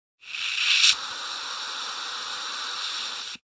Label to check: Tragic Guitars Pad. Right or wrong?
Wrong